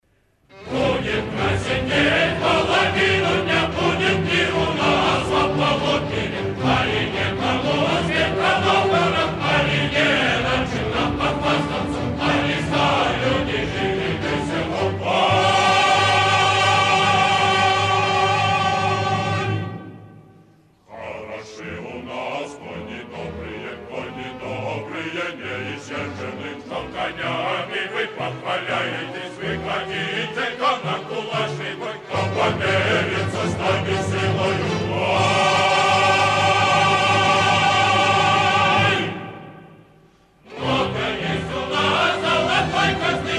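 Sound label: Rimsky-Korsakov Opera Sadko chorus and scene, male chorus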